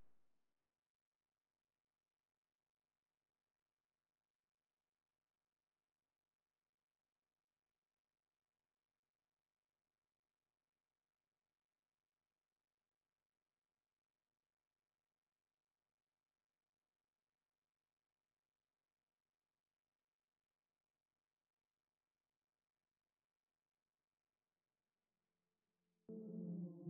92 BPM D Min Weird Synth; Melody; Loop Stems; Cymatics; 2022 Melody Collection Platinum Expansion - Pt 2; Top Floor

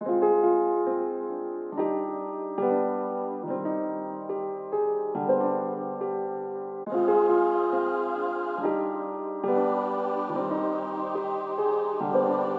140 BPM C Min, melody; Bloodborne; Cymatics; 2022 Melody Collection Platinum Expansion

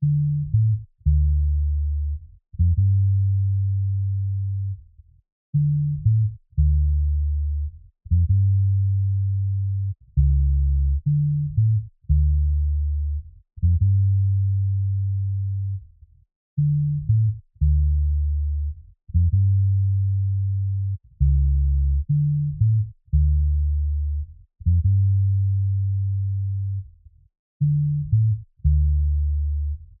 Waterfall - 87 BPM D Min Bass, Afrobeats; Melody; Loop Stems; Cymatics

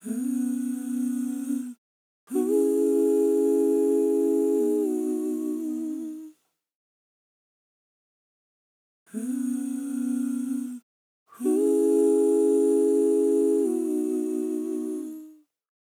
Vocal Dry - 106 BPM E Maj; Cymatics; Infinity Vocal Collection; Angels Vocal

